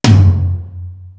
AMBITOM; East West; Global Sounds

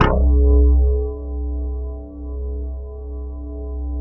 RATTLE BASS C; Greytsounds; Scott Peers Sound Engineering

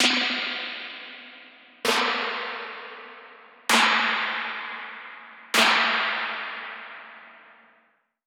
snare spring slap kit; Polyend; Recue

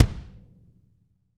BD, BD REAL

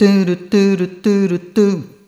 Rhythmic G

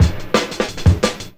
175, Breaks; Drum&Bass; EZ Rollers

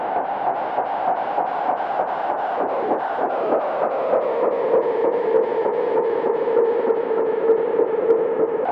TRAIN, ATMOS; AMG - Vince Clarke Lucky Bastard

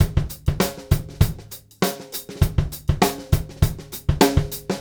Straight Kit, World Pop Beats; Straight Kits; Sonic Foundry (Sony Creative Software)

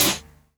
HH, Dance Mega Drums; Best Service; HH HIP

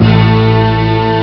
deep bass strings; ST-XX Amiga Soundtracker Sample Packs

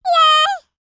LICKS, Vocal Planet Jazz FX; Spectrasonics

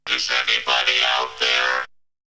Vocal; ZERO G - Datafile DISC 1

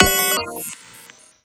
fx burnt circuits

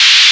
NOIZ LP1, Akai MPC2500

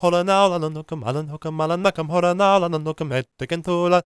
GAELIC, World Voices; ILIO; Vocal Planet

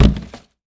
Plastic Dustbin, household rhythm samples Hits

